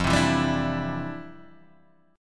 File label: C Major Add 9th, F, chord; strummed; JGuitar